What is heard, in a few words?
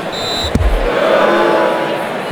SFX Soccer Failure; life is a game android